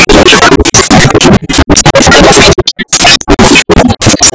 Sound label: Dust Devil